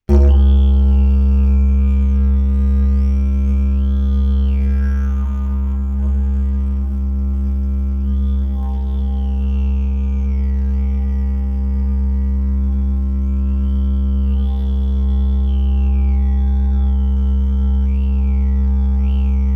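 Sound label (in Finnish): AKG3000 test old didgeridoo; Wanha Diggeridoo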